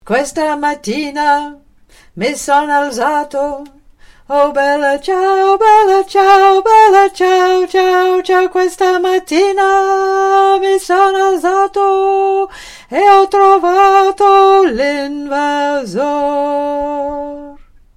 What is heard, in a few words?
Alto; Bella Ciao